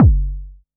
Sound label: Analog SY1 Kick